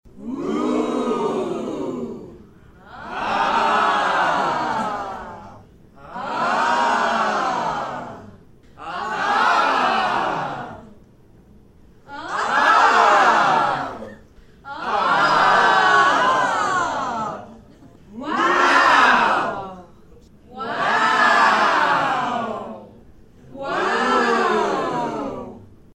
razlichnye vozglasy gruppy ljudej 8 chetkoe vau; Вау звук

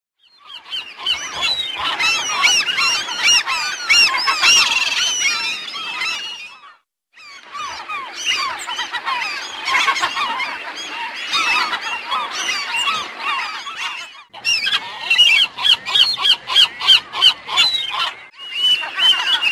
burogolovye chajki bolshaja staja

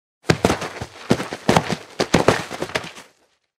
chelovek padaet s nebolshogo prigorka

